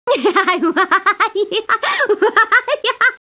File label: LAUGH; BattleSNDS